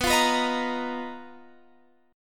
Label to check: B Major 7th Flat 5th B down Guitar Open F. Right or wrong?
Right